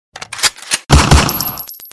tiro; shadowrun

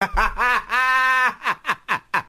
risa; pancho saavedra

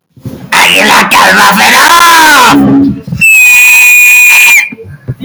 aguila calva

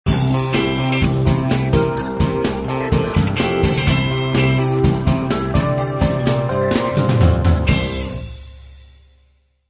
cortina musical

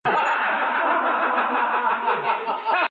risas

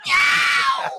miauuu; la foquita

